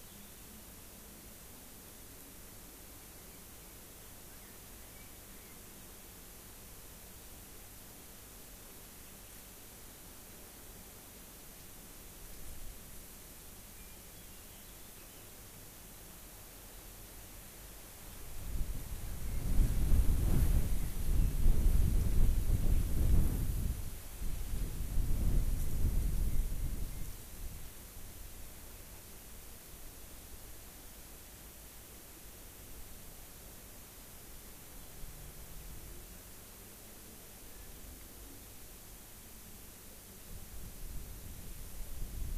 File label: rayol farm; SEAMED